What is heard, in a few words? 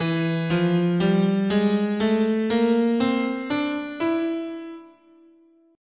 E Eight tone Spanish, scale